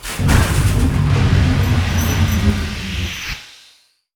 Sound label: Pellet Machine Down; moul-assets